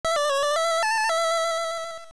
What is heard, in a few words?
Flute, Playing the Flute; AOL